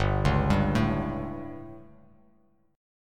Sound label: G Suspended 2nd Flat 5th G, chord; Gsus2b5 arpeggiated; JGuitar